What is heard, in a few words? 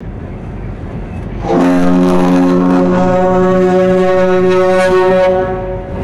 deep resonant ship horn blast; horns